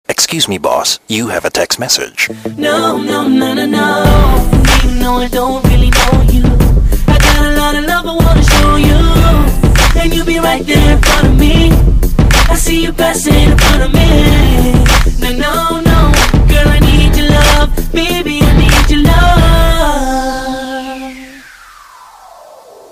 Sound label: Msg Cool